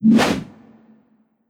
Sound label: Throw; Legend of Keepers; Mecano